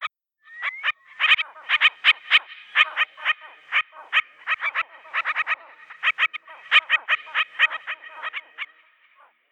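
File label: Plant Ext Random Lake Birds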